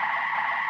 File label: car brake skidding; simpra